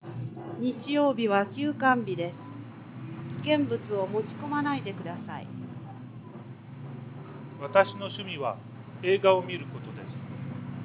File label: bus